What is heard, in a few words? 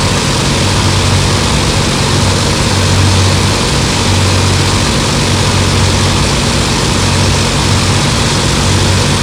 Aircrafts Engines Packard Merlin Prop Cockpit Ex In; DCS